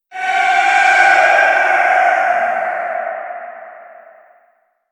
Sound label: Rell Specter Vocal Death; Enemies; Warframe; Priest Quest